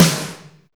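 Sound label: ROOMY SNR, snare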